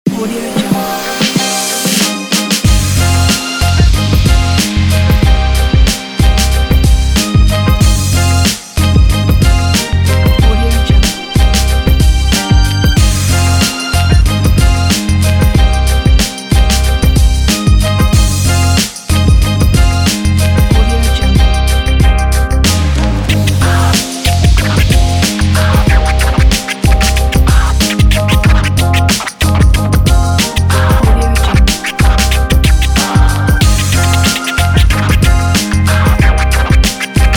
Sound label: hiphop